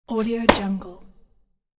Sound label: cloth brush with fast body impact; hollywoodedge